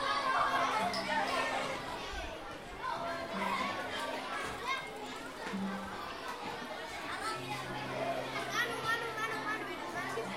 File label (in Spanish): Gotas